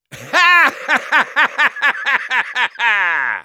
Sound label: laugh long, de; Soldier